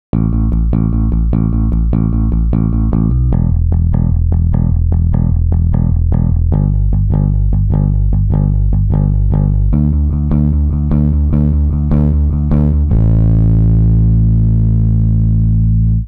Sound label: Bass Att Rel Distortion